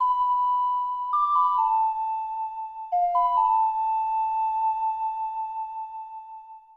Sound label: Ocarina (New Wave Bossa Nova); Legend of Zelda The Majora's Mask; N64